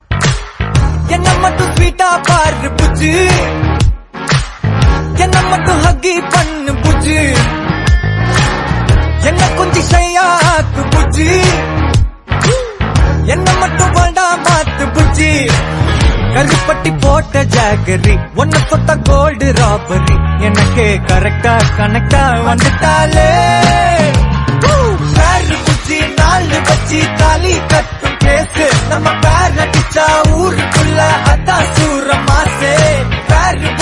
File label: Telugu Tamil Ringtone